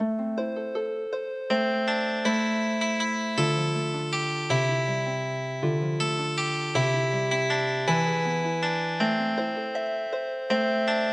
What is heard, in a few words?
Healing Harp, traditional; El Pajarillo